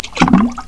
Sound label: Into Water; Blobbo 1.0.2 Lite